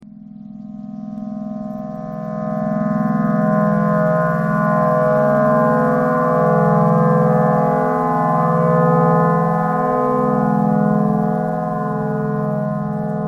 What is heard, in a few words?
clarinet thread noise